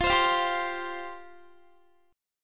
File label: F Suspended 2nd F down Guitar; Fsus2; strummed; 4E,4A,5D,5G,5C,6F